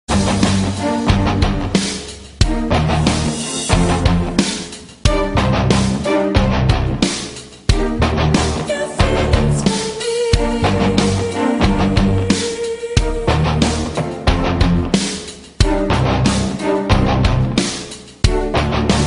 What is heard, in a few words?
angels music ringtone